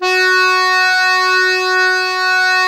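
DIATO F#2, diatonic accordion, Historical Instruments; AKAI; USB Soundscan vol.23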